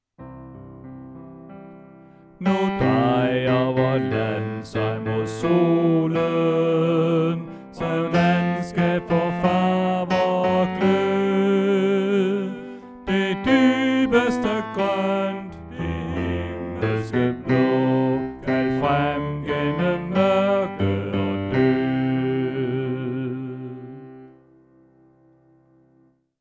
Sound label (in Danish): Bas; oevefiler